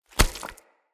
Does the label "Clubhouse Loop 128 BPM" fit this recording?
No